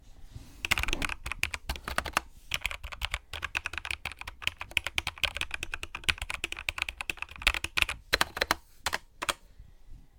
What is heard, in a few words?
Logitech Pro X TKL